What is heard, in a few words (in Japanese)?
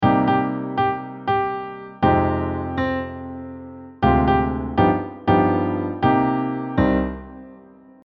スケールで考えるメロディー